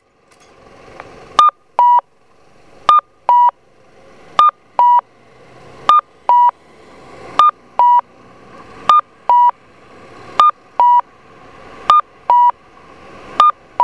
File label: kakko, onkyo kosaten; kyosan LTA-BMS-2; okayama; higashi; saidaijikami 1chome